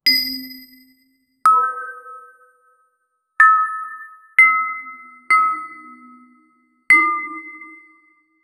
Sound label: create ui sounds that fee, lego